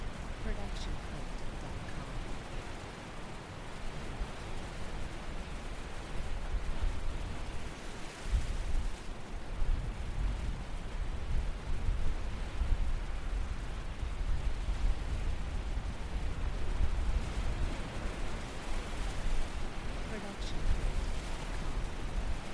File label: loopable waves on beach windy; soundscrate